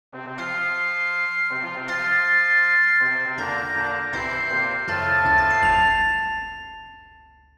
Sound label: fanfare, musicbox